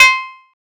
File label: Cowbell, Percussion Hits; Zero-G; Soundclash; Acidized Wavs